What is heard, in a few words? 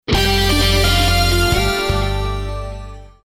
Traffic Sounder